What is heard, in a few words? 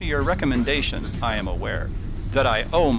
noisy